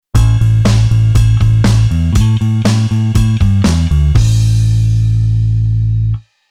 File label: Bass Genre Basic